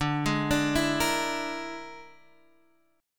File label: E 7th Flat 5th, D